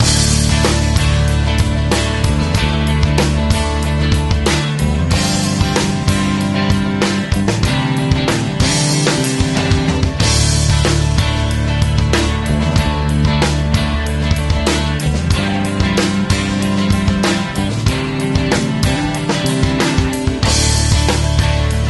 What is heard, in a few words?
Light Rock